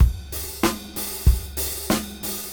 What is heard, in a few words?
Cym Funk 99 BPM; AMG - Keith LeBlanc Phat Butt Jams